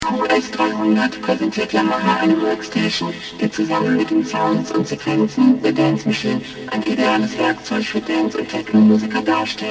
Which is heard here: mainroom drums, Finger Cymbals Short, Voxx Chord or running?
Voxx Chord